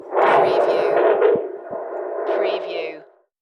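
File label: Radio Static Scratch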